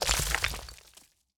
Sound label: environment block upgrade stone